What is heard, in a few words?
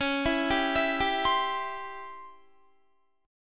Db Minor Major 7th Flat 5th Db down Guitar; DbmM7b5 arpeggiated